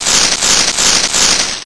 skulltula climb; zelda

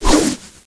wrench miss; svencoop; legends

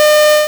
pong start; w00tguy; arcade

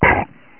die; svencoop; uboa; ichyhakase

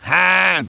door stop; svencoop; cracklife

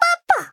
sqk deploy; ragemap2019; giegue; poppo

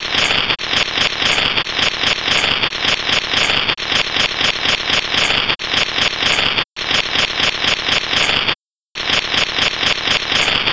skulltula move; zelda

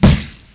hit, zombie; monsters; svencoop; quake1

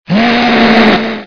alert, zombie; mistake coop

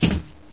metal, player; mistake coop

